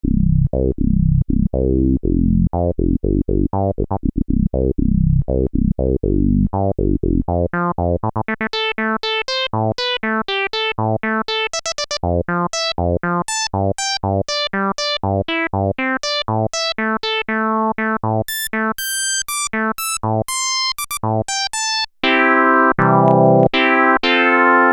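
novation nova desktop filter resonant low pass filter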